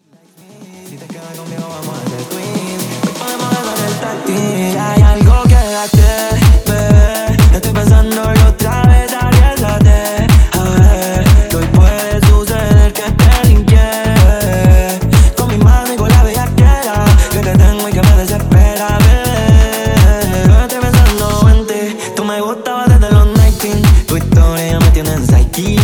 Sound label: tech remix 124 bpm